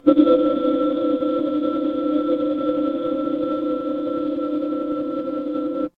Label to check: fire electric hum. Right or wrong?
Right